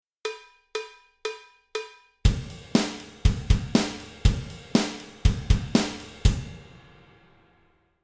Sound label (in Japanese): kihon ride, drum